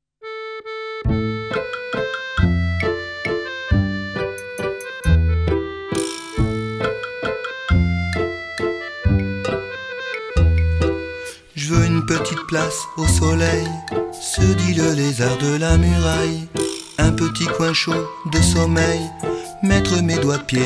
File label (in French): ensemble vocal variété jazz chant du monde